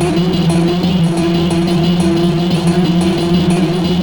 (arp) industrial rumbler; Sonic Foundry (Sony Creative Software); Ugly Remnants VOL-2

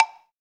PRC BLOK, AFRICAN PERC; Roland LA

